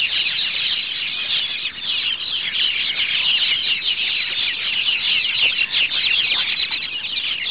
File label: roost, myna; identification